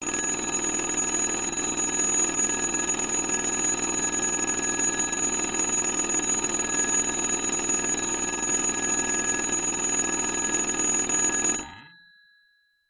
siemens fetap 611-2 long ring generated with a 20 hz signal; 1970; Sound Ex Machina